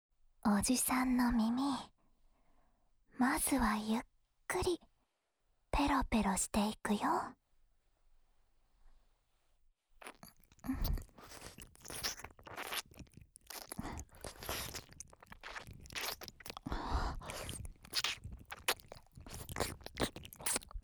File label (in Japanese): あまあま耳舐め（右耳）, ASMR; 万智